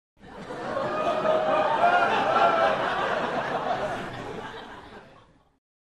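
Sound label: Crowd 100 Laugh Reaction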